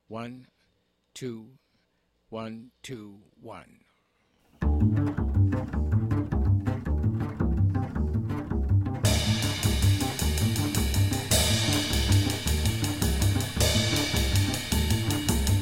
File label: 6-8 quartet bass drums only